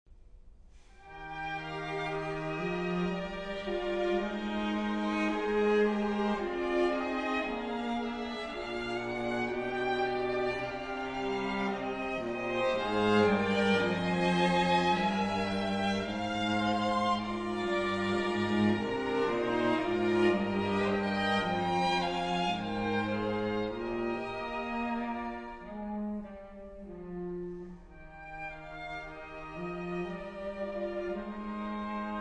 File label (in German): Streichquartett c-moll - Adagio; Hans Rott; Mainzer Streichquartett